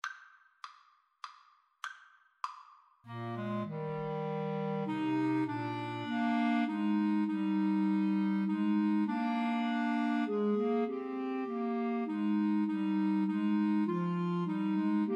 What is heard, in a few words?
Clarinet Trio